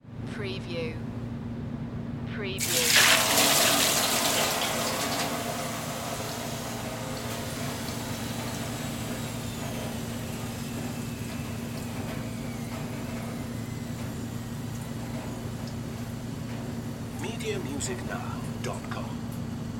Sauna Pour Water; Sound Effects Bible

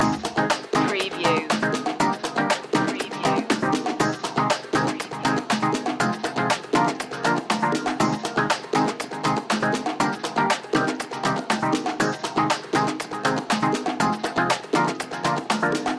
flowing rhythm loop

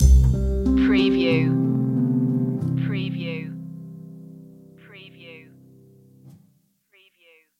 sing me a song stinger

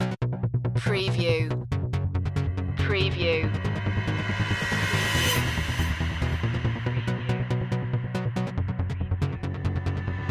swirling beats loop

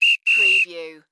Sports Referee Whistle Blow Multiple

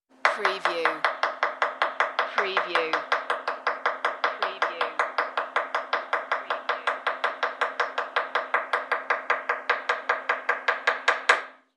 Chef Cutting

